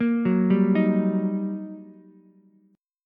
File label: G Minor Major 7th Sharp 5th, down, Ukulele